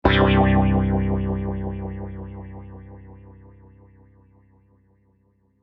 Wah Wah Guitar, Wah wah electric guitar transition stinger; Stingers; Sound Design